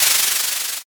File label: cloth rip